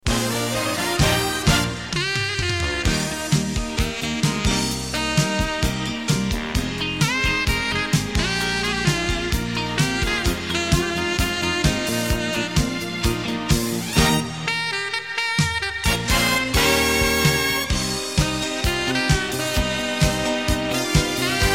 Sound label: Instrumental; WCCO AM; 60 Years Strong